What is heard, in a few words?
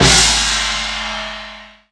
Gong; Tingle